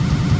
Roll Fast Loop; Goron Link; Zelda; MM

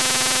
Zap; Zelda

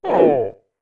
Punch

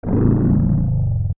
Moldorm Mono; Moldorm losing a body segment (also Ganon getting stunned)

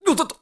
Balance Ball; Link balancing on a rolling ball; Skyward Sword

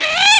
Attack; Kargaroc

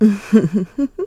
Stylish Woman Chuckle; chuckling

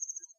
Night Cicada Fade; Zelda; OOT

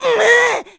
Angry; Link angry (when Aryll is kidnapped)

